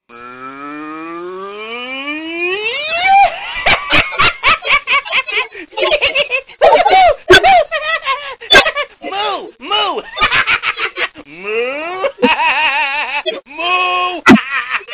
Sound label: Cow Laugh